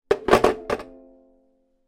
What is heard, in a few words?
ukulele down on hard surface; musical; zapsplat